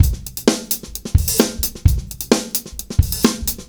junglist, funky drum loops

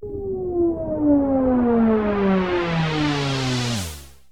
projectile, zaps and beeps

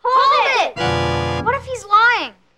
ORGAN STAB; Voice; questions; hold it; what if he's lying

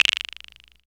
cricket, Misc Percussion Clavas

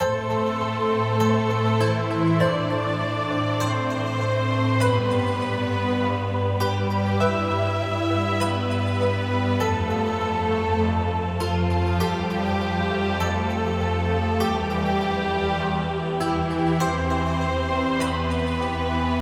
keys, Loops, Sad

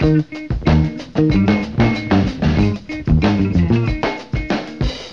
full, Loops Phonky

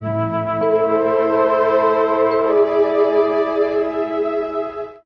strings, Loops, Sad

